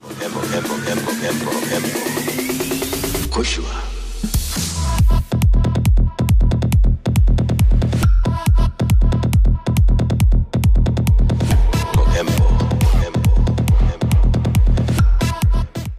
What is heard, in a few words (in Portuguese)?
Trance, musica eletronica; Curso Masterizacao para Musica Eletronica